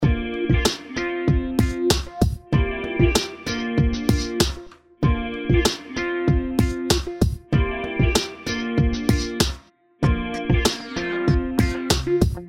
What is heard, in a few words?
Electropop; Pirates, Viking and Knights II